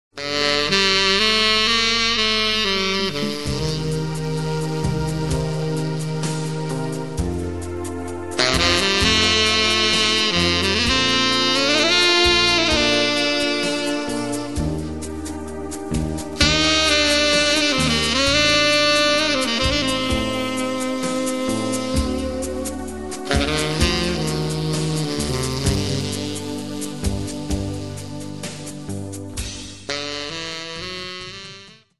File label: BALLAD